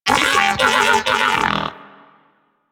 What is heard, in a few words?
Moa Pet Enemy Spotted; Warframe; Pets; Tenacious